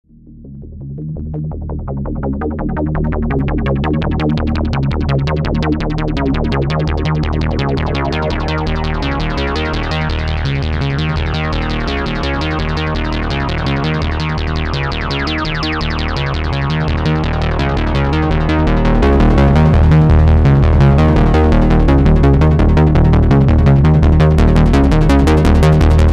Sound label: arp